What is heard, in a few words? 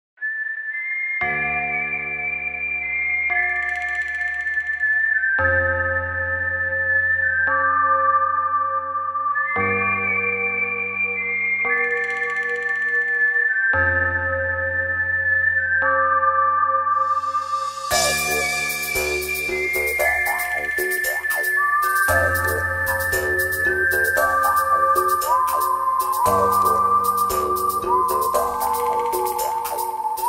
western